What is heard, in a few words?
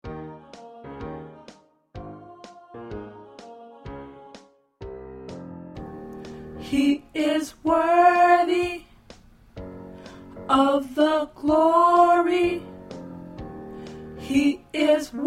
Tenor; gospel songs